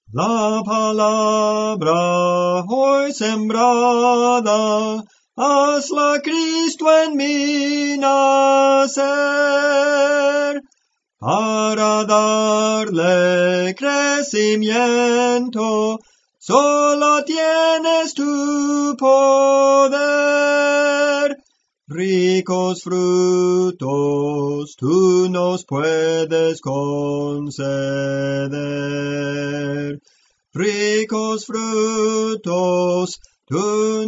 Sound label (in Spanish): Soprano, Cantos Espirituales; Soprano (melodía)